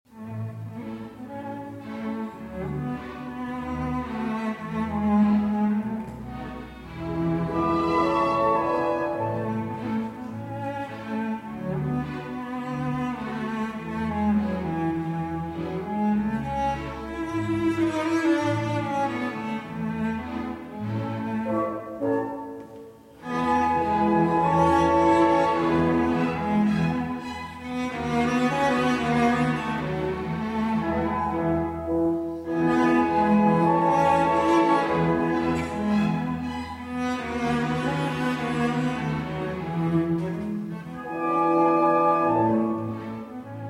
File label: Cello Concerto No. 1 in A Major II. Andante Allegro (Live)